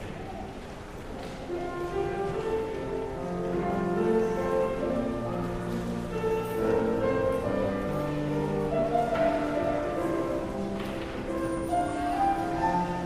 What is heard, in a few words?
Choral